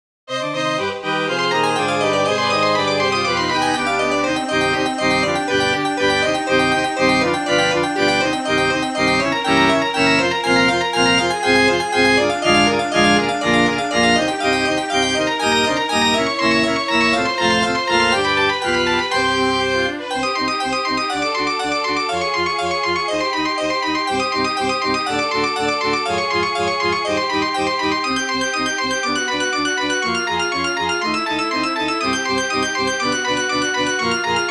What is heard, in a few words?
MIDI Microsoft GS Wavetable Synth; Rosenkreuz Stilette